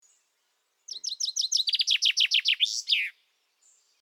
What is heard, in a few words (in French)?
pinson vieedjieu, pinson des arbres; Gaston Gast